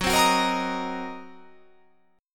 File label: Fsharp 6th Flat 5th down, chord